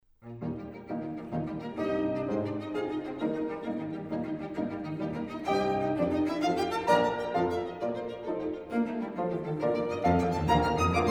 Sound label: piano trio; jadassohn